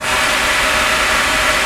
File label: Trumpet Breath; Digital Sound Factory Virtuoso